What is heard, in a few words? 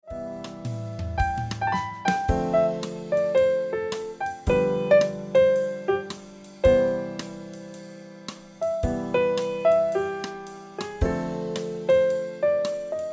Piano Music